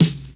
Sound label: focused snare sample E key; Duration 0:01; Channels Mono